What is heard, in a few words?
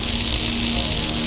Projectile Flight Loop; Weapons